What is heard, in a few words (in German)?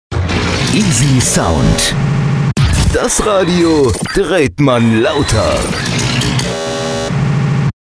jingle; easysound